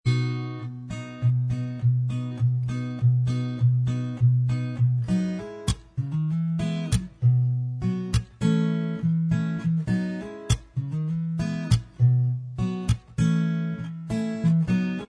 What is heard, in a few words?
acoustic Inst Track